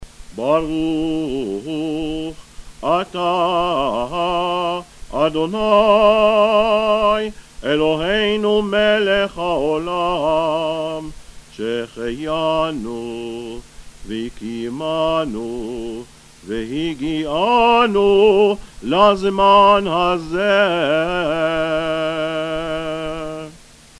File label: blessing before megillat esther chanted